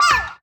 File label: jump